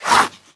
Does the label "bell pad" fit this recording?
No